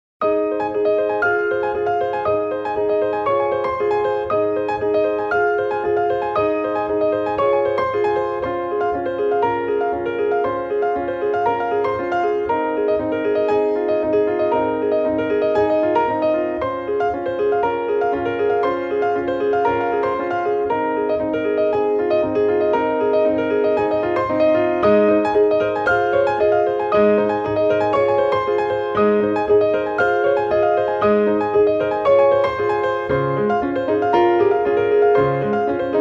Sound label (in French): les deux pianos